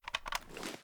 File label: reload fast raise; gmod